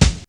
J SOUL, KICKS; Zero-G; Funky Elements

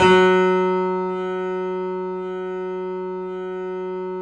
3D Boesendorfer, Pianos, F#; E-MU Producer Series Vol. 5; 3-D Audio Collection

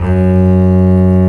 BASS F#1, Solo String; E-MU Producer Series Vol. 2; More Studio Essentials; Composer; Solo String Combo

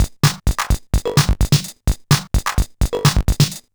Bent TR505 (128BPM), Circuit Bent

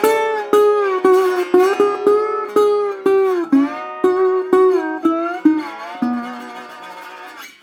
Blues Guitar; ILIO; Fretworks - Blues Guitar Samples; 120 SIMMS LICK

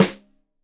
Rim Shot Stereo, drums

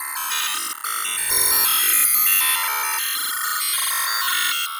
Highly Strung Strings; Sonic Foundry (Sony Creative Software); Myths of Technology

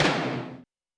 Tom Lo Thrash, Alesis D4

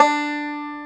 BANJO D4, ETHNIC; AKAI S-Series CD-ROM Sound Library VOL-8

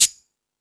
Rock N Roll, Drum Machines

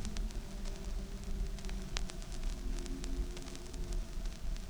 Vinyl, noise

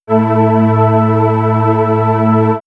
Swirls Ambient Drone, pads; X-Static Goldmine

